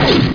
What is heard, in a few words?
Bumper Tail; Crystal Caliburn